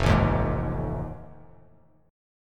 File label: F 7th Sharp 9th; F7#9 strummed